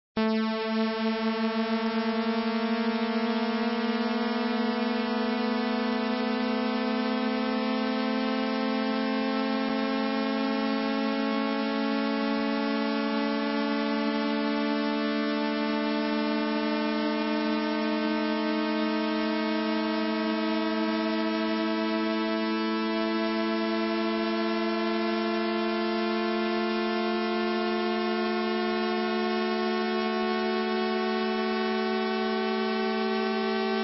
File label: 2 octaves 2 sawtooths